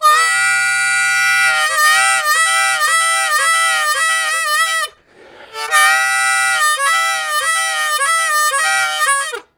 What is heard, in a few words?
Grind, Harmonica; Zero G